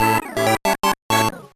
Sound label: Unknown Jingle; Sonic Advance 2